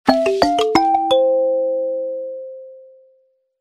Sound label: notification ringtone; google nexus 6